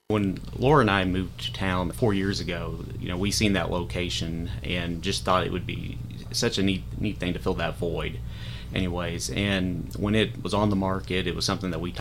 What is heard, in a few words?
Harp